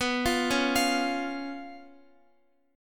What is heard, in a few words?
B Suspended 2nd Flat 5th, Guitar; Bsus2b5; arpeggiated; x,x,11,10,13,13